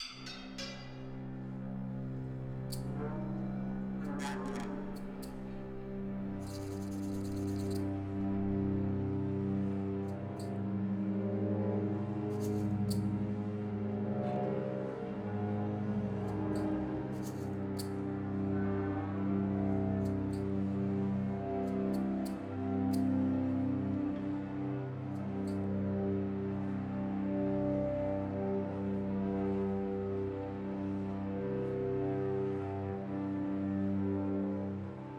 Dark Emphasis Stinger

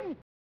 ''Oof!''; David Crane's Amazing Tennis; snes

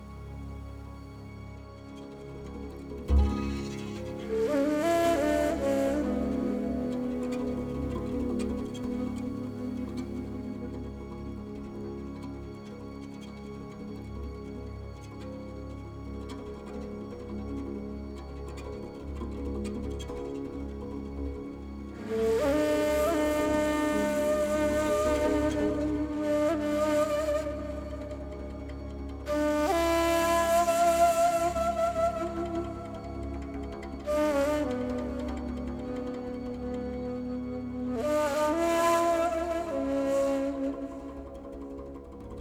Music Menu; Battlefield 1

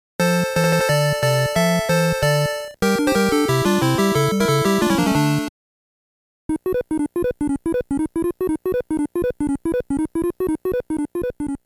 Game Start Bonus Target Appears Stage Clear; Famicom 20th Anniversary Original Sound Tracks Vol.1

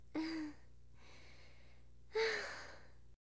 Massage; Bathroom; Hilzartov; Fairy Size Doesn't Matter

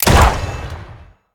Gun Fire; The Town

